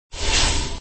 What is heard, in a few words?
attack; endless world idle rpg gamerip 2019